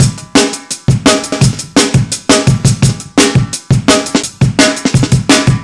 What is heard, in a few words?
170 live jungle drums